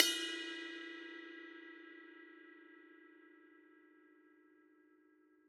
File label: Ride Cup; Drums